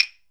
HYOSHIGI, JAPAN PERC; AKAI S6000 CD-ROM; WorldSounds

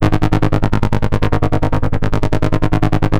Arp Pulse, Synths; Sonic Foundry (Sony Creative Software); Electronic Point Blank

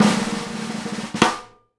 snare roll hit; E-MU Producer Series Vol. 8 – Platinum Phatt; Live Snares

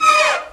STRING LICKS, Disco; Best Service ProSamples vol.48 - Disco Fever; AKAI

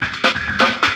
Break, Drum N Bass Percussion

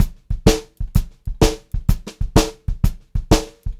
VARI, Pop-Rock Drumloops; USB Soundscan vol.37; AKAI; 90 s Sample CDs

